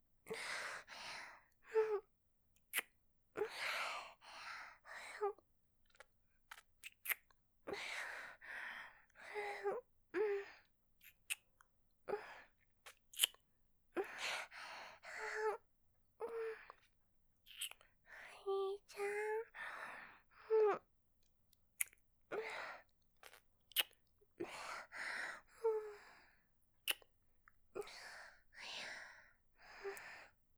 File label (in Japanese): キス(ディープキス); 純真無垢な妹の無邪気なゆ～わく